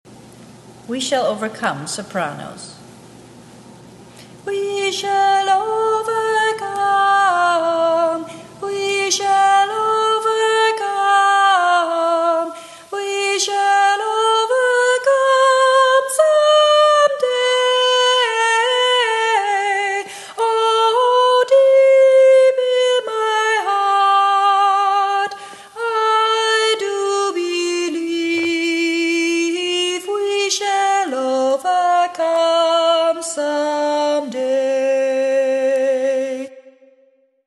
We Shall Overcome sung Soprano

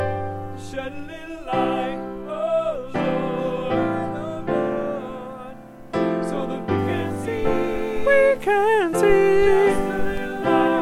alto, choir

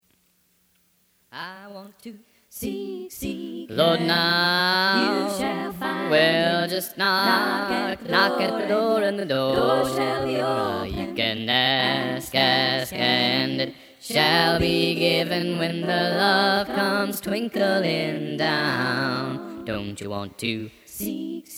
bass

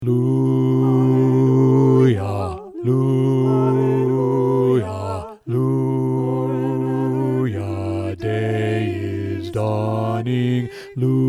Bass; 2023 Fall Repertoire

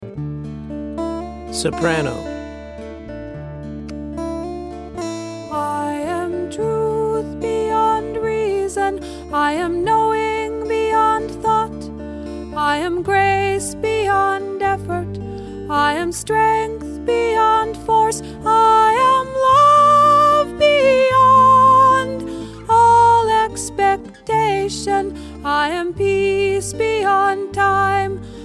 A Prayer Beyond Soprano; 2023 Fall Repertoire

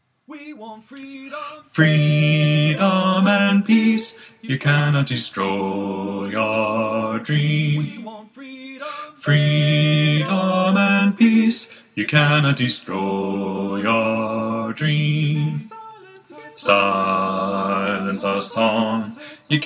Bass

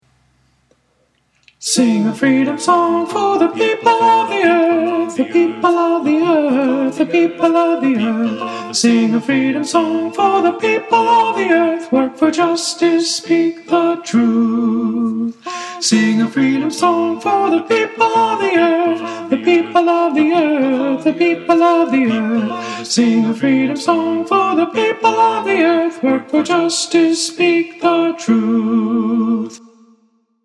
Sing a Freedom Song Alto

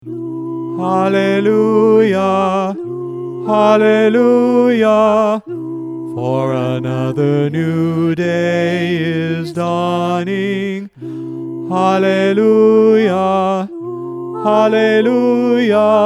Tenor low; 2023 Fall Repertoire

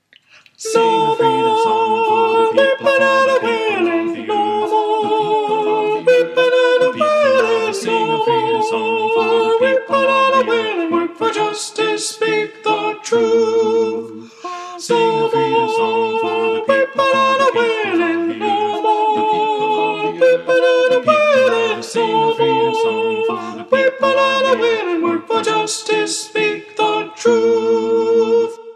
soprano

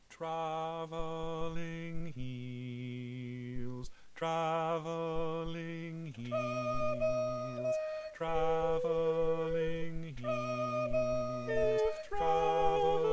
tenor

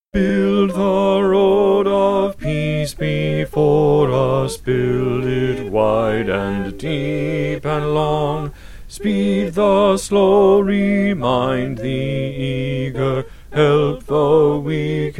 bass; 2023 Fall Repertoire

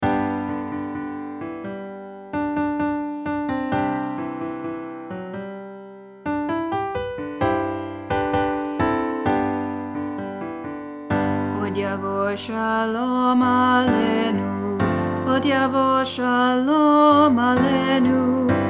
Salaam Solo; 2024 fall repertoire

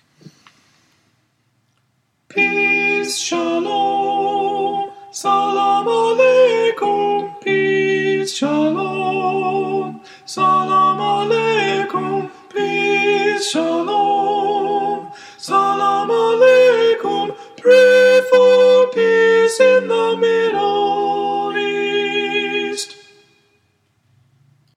Soprano

